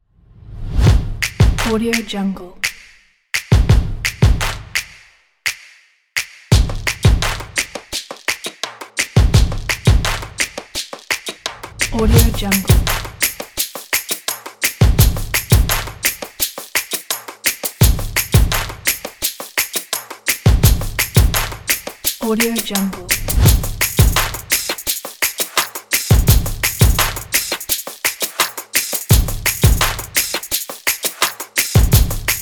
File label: slow stomps percussion